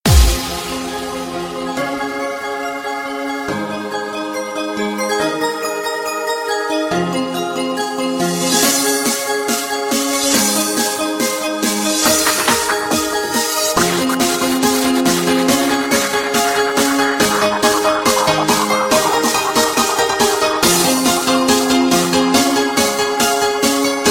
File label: bells and synth